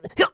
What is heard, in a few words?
jump; Scrat